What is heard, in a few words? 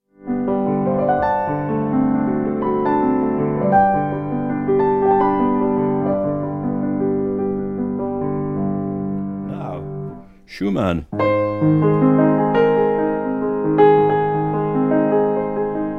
concerto in a minor for piano and orchestra; op 54